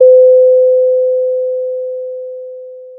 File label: Sound of a doorbell